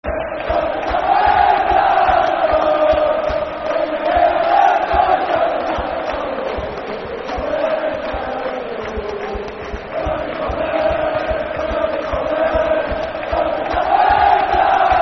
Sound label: We'll Be Coming [Football Chant (Soccer Song)], Scotland; Scotland Football Team; Scotland Scottish